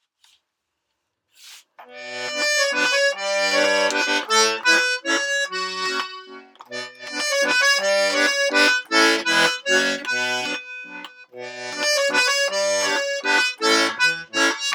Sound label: ARTIGIANA AD2